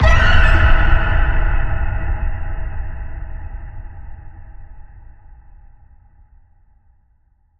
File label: Phoenix Squawk Boom